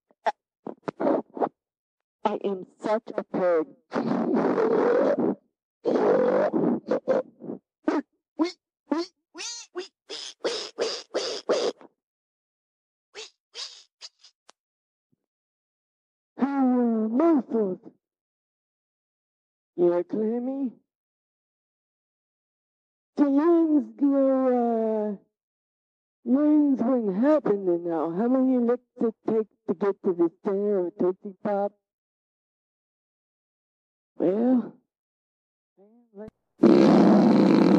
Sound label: various sfx